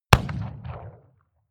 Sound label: revolver shot 44cal remington 100m